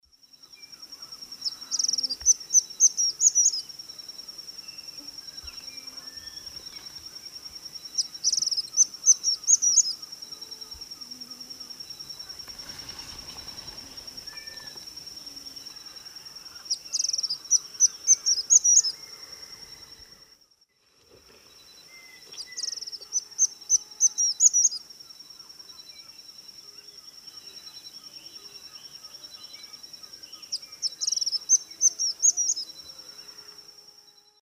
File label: Tyrannus albogularis, song; S. America; Eastern Lowlands